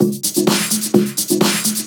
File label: Latin Loop 128 BPM